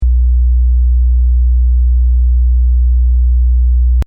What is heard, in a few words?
boooooooooooooooooooo